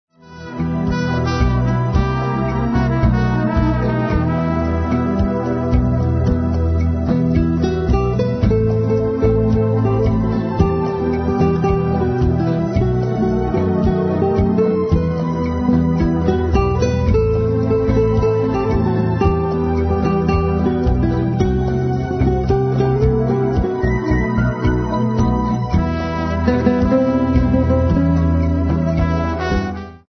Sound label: ballroom